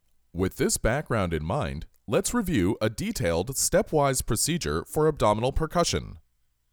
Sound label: Percussion; Ab Percussion